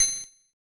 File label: collect; AMOS Music